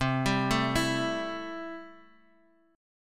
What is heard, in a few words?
A-Minor 7th C